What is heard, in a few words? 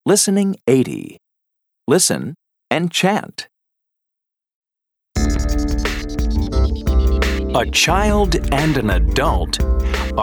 Chant